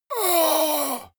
monster gasp; kreaturen

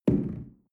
shell place on tablecloth wobble medium short; berufe; gastronomie; soundpool

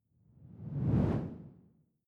whoosh simple incoming low; swooshes-swishes

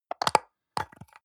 soap dispenser press almost empty; haus-garten; badezimmer

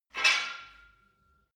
barbell weight rattle hard; sport freizeit

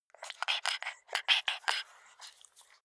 pug pant smack; natur-tiere; hunde

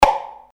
plastic cup; haus-garten

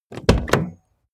car bmw e46 exterior door front open short; Cars

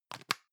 plastic can lid screw close medium; objekte; dose

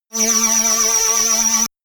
shot buzzy annoying tooth, zaps buzzes

